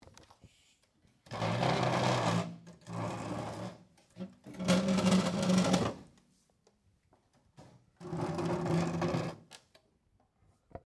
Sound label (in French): chaise